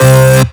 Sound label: Synth Shot C